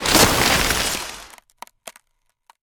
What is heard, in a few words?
Ice Platform Appear; unsighted